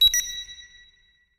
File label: fail